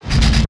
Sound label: SCHRANZ TAIL; TECHNO PARADISE